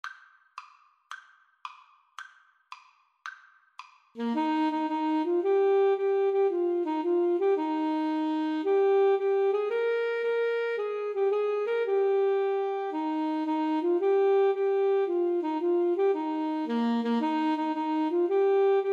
itsy bitsy 2 A SAX

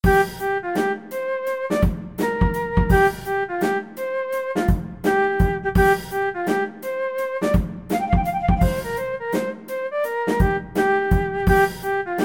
Funk Study, flute

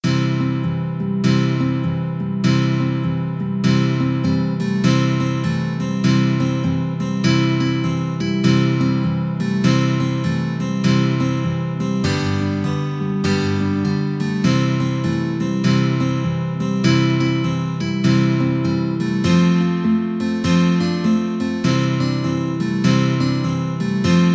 guitar ensemble

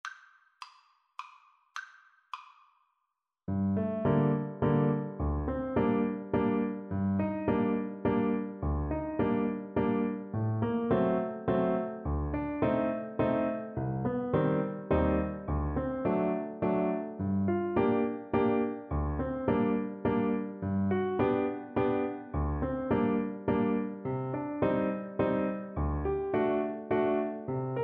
Clarinet

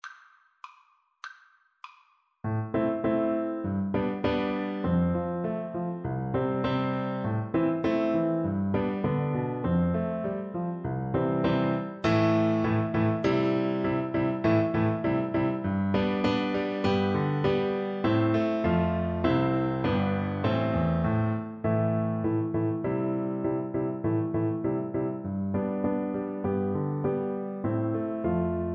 Recorder

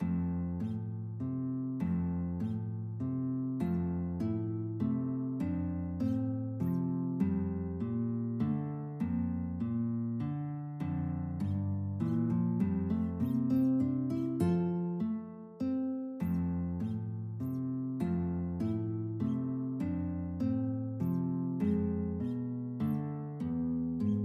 guitar duet

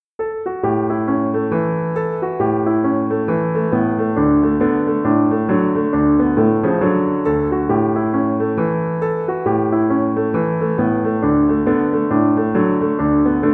Pig Ankle Rag PNO, piano

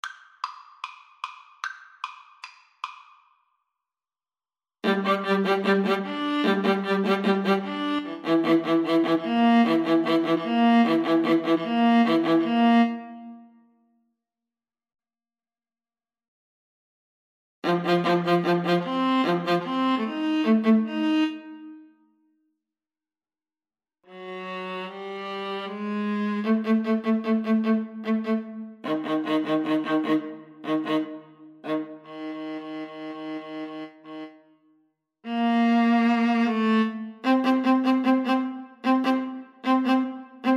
Viola duet

